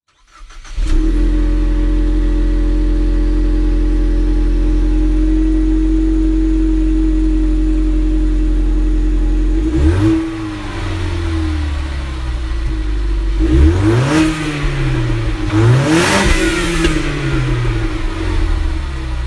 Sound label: Mustang EcoBoost